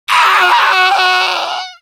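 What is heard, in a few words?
Demoman pain critical death fr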